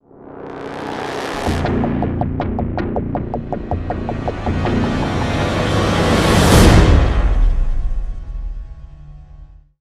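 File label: stinger guitar brass swell; transformers war for cybertron gamerip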